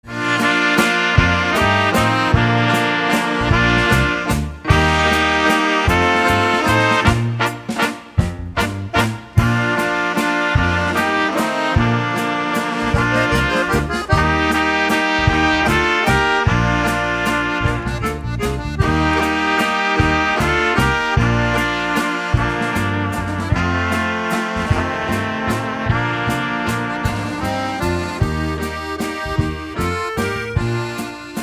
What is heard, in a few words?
Waltz